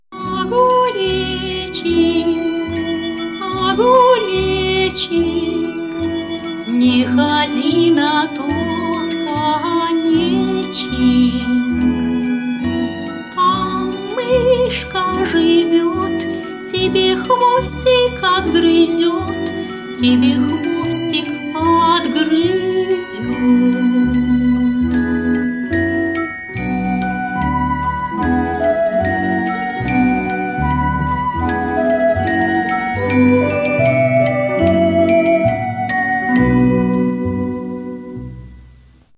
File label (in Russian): Колыбельная; Приключения Огуречика